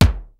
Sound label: kicks snare, kid; Kid Nepro; Elite; KICKS SNARES